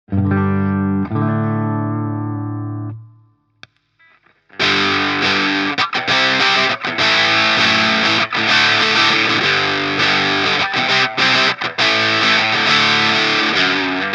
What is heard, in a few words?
Electro Harmonix Satisfaction Plus Tone Bias Fat off